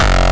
pure hardcore kick; Kicks; single hits

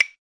nipon wood block, Japanese Drums; ETHNIC and WORLD PERCUSSION

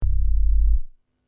tight square G1, Tight Square Bass

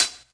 classic hh; STYLE KITS (Hip Hop, Etc); Classic Hip Hop Mega Kit

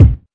classic hip hop kick; Classic Hip Hop Mega Kit

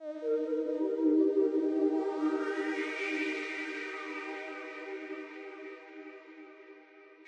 gentle sad pad melody, SYNTH AND ELECTRONIC

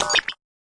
fx drum

